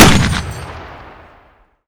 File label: mg42 cooldown; weapons